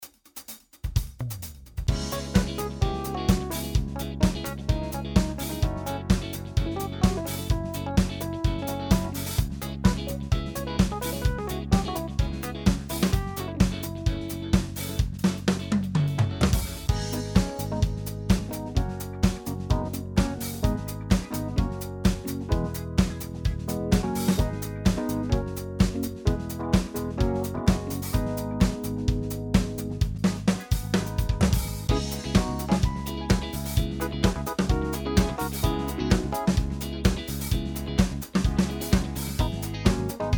Drum Disco 120

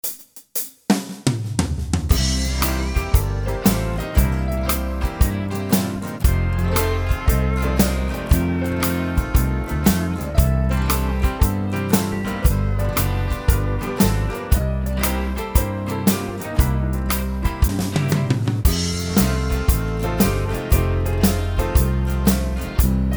COUNTRY SHUFFLE

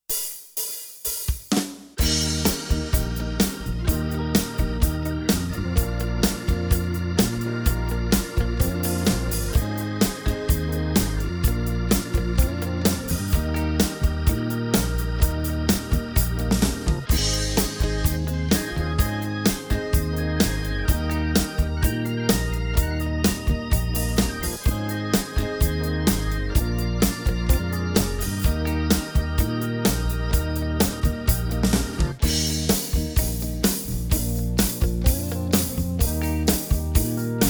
Discojazz

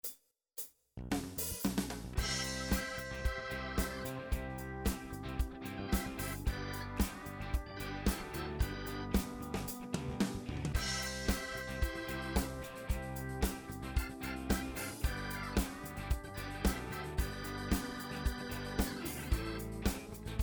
Country pop

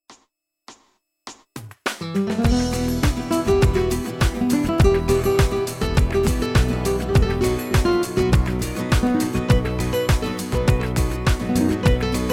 ACOUSTIC STRUMMING 90

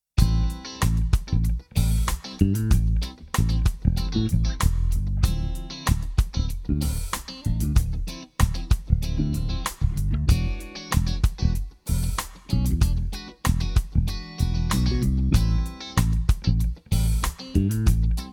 funk organ bas

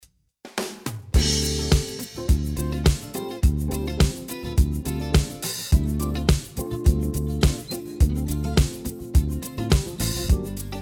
radio funk

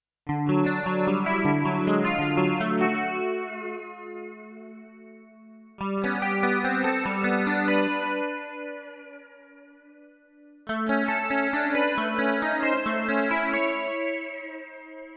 COUNTRY PACK

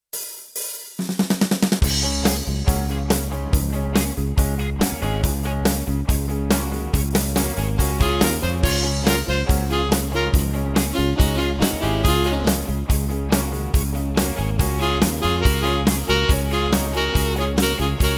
driving country

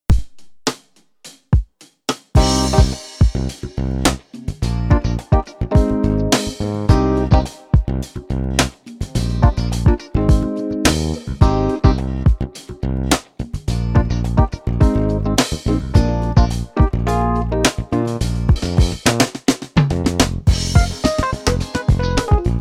Crispy Motown

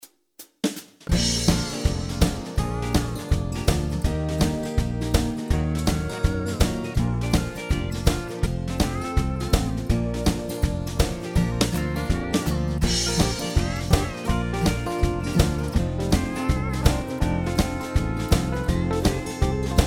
pedalsteel swing

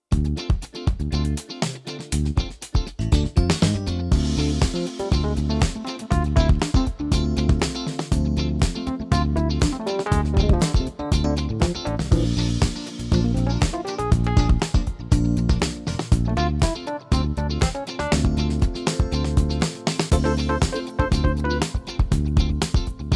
Funky 120

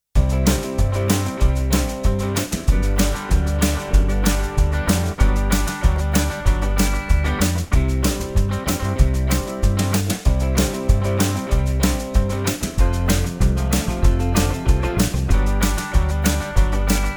zydeco

